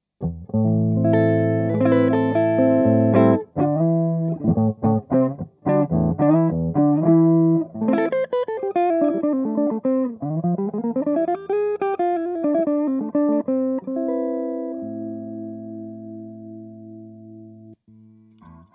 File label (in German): Akustik Moduliert